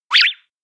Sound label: elec hook something; anemoi